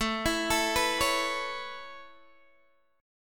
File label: A Major Add 9th A Guitar DADGAD, chord; 19,19,19,16,16,x; Aadd9 arpeggiated